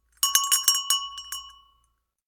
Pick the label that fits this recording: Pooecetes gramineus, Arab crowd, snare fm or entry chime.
entry chime